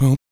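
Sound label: vox percussion, Vocal Planet Jazz FX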